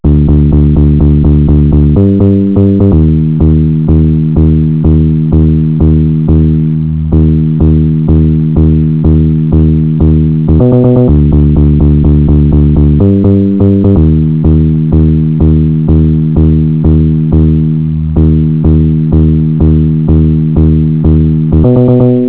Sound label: tune